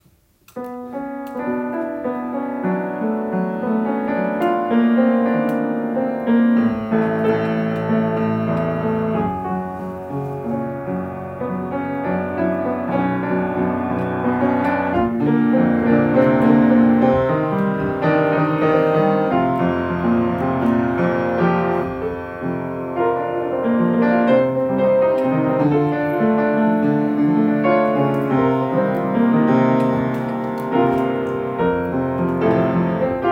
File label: Piano